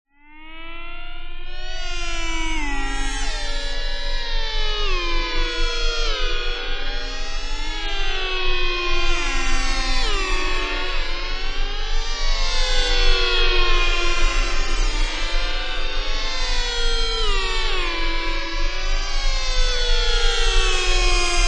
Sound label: sound design spooky weird whining tones; zapsplat